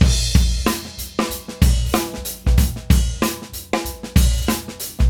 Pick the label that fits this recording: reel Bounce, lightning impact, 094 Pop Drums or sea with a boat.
094 Pop Drums